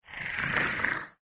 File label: tentacle horror; Barotrauma; Barotraumatic; Seasnek